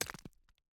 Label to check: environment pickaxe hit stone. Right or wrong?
Right